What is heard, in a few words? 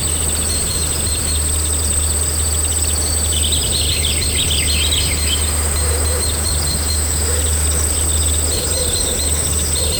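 peaceful rural farm ambience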